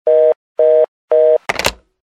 Teléfono colgar

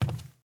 Paso zapato madera; yesterday origins gamerip 2016 switch ps4 pc ios macos

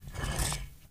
QUITAR LADRILLO

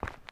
Paso zapatill cemento

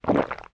Beber

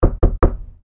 Puerta golpe

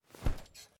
sentarse cama; yesterday origins gamerip 2016 switch ps4 pc ios macos

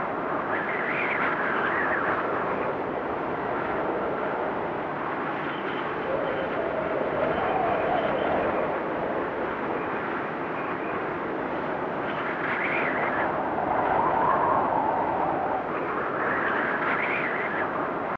Ambiente ventisca; Yesterday Origins

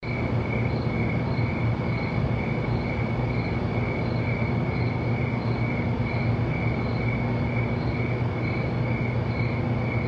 Ruido fondo noche

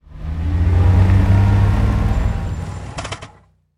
FURGONETA MARCHA ATRAS FRENO

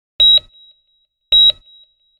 SFX salud alta

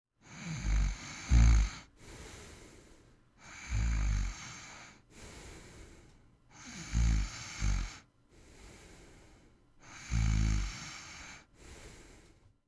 RONQUIDOS

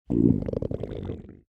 Tripas

